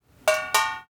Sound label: water drip into pot lid with light ring off; prosoundfx